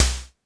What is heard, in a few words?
polyvox snare; Formanta Radio Plant